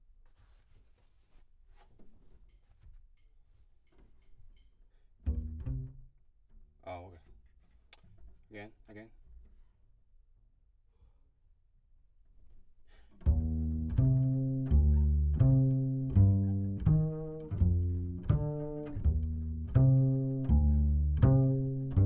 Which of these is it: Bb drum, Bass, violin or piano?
Bass